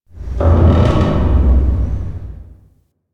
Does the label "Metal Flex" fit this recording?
Yes